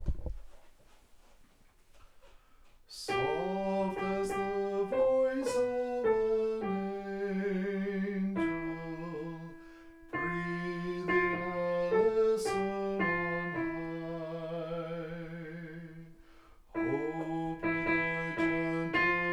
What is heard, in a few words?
soprano